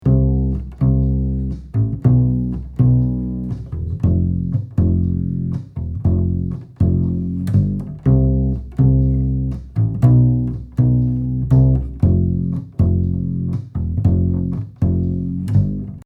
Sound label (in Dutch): Contrabas oplossing